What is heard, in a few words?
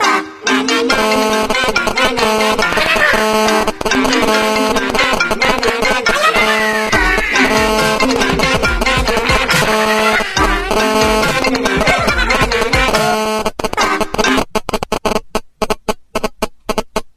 iphone Minions Ringtone